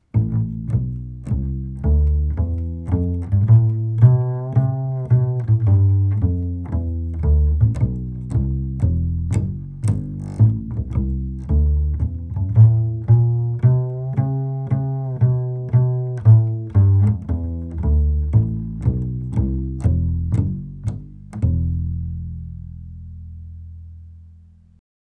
Walking Bass Through All Strings

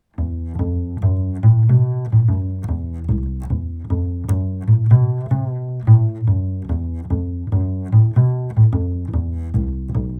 Walking Bass All Four Strings

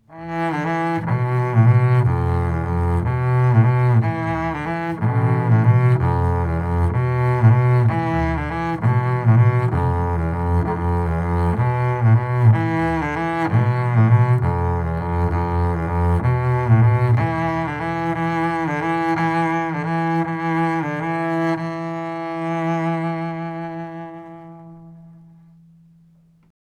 Bowed Pattern Through A G Strings

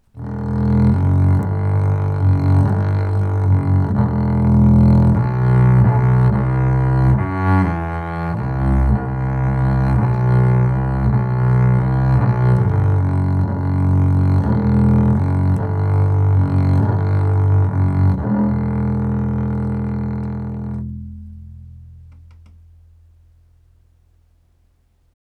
Bowed Dark E D String

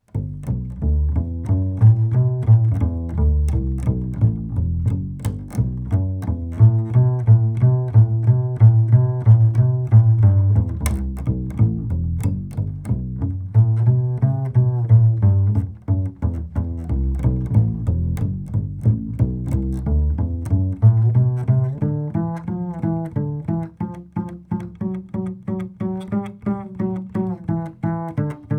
Walking Bass Through All Strings Quick

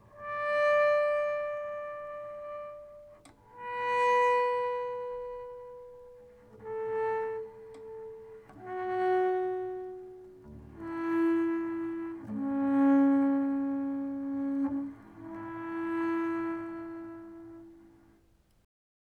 Bowed Harmonics Slow

